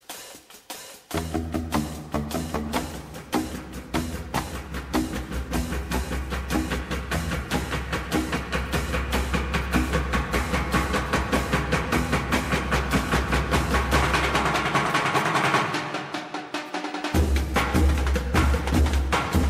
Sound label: Drumline